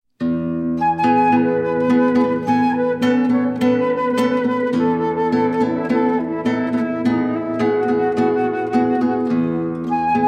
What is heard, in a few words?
Flute Guitar Moderato; Three Pieces for Flute Guitar